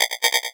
itchy noise; drums pads; noises

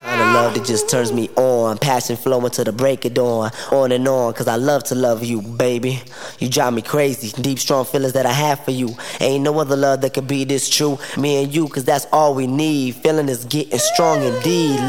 Acapella

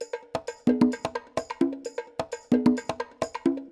Loop Percussion 130

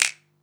Percussion

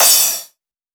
Percussion Crash; drums pads

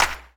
Clap

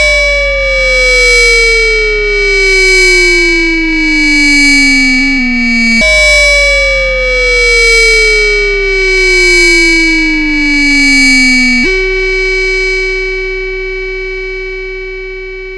NOISE FX; drums pads; noises

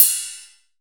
Ride; maschine

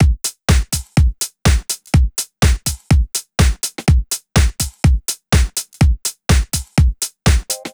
HI real thin

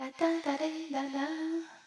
tadam

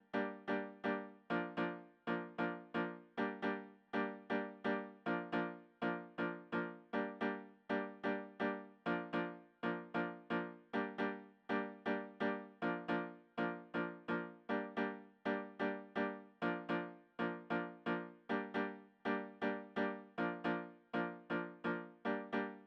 lead

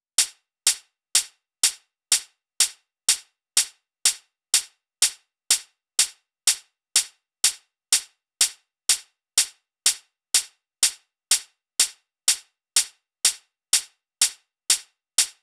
Percussion Hi Hat; drums pads

